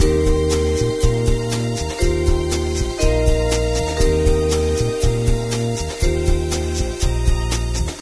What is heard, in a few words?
Cafe Music; Diner Dash 2 Restaurant Rescue